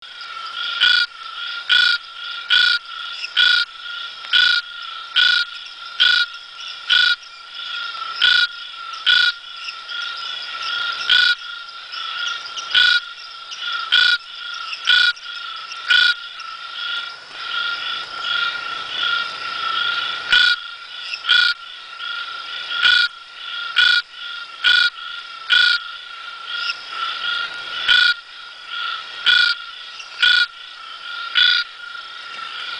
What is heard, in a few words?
Scinax crospedospilus